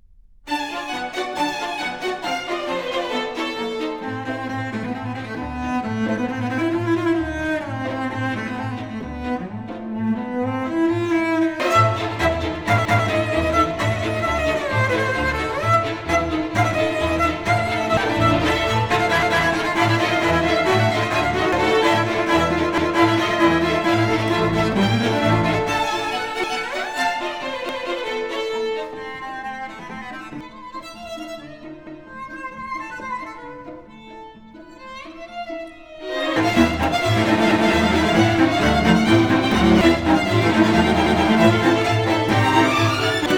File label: (Arr. for Cello and String; 쇼스타코비치; From Jewish Folk Poetry, Op. 79; The Song of Misery